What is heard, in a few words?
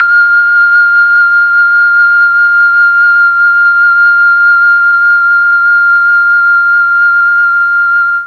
F4 mellotron FLUTE